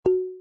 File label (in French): tampon